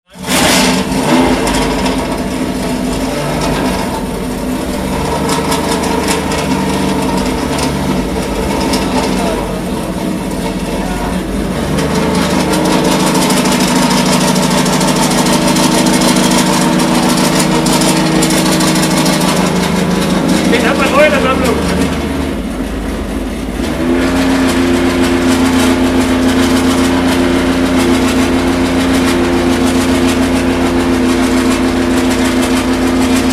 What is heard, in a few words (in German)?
Porsche 911 RS 3.0, Motorgeräusch des Porsche 911 Carrera RSR 3.0; 1974